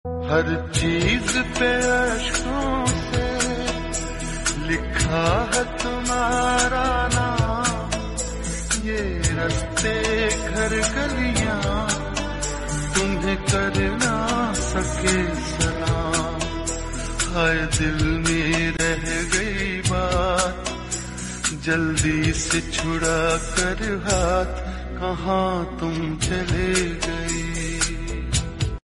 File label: Very Sad Heart Touching